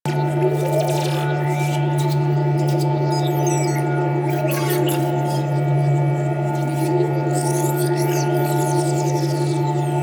Sealed Cache Terminal Loop; Warframe; Zariman

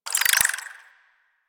Bird Clicky; Ambience; One Offs; Warframe; Eidolon Plains

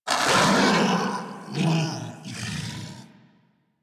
Amored Vocal Death; Enemies; Armored; Warframe; Kubrow

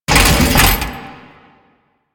Bodyfall

